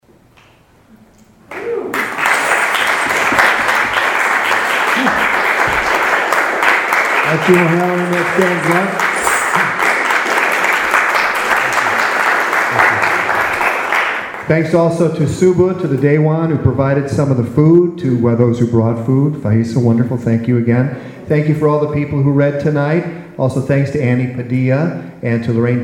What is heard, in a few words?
Closing Applause